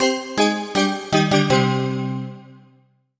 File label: Bell Voice Negative; MUSIC EFFECT; startide